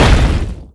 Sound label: attack; dota; skeleton king